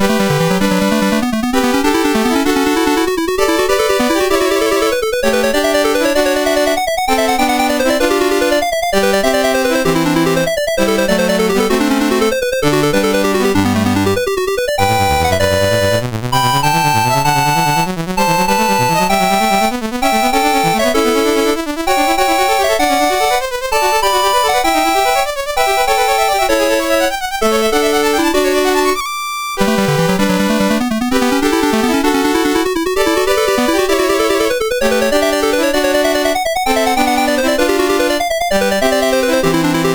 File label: Variation, MOS6581R4; Goldberg Variations